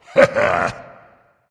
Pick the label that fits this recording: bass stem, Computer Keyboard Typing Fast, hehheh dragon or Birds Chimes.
hehheh dragon